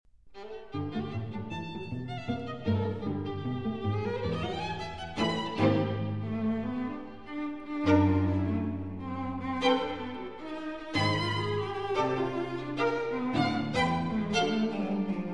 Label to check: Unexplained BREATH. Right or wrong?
Wrong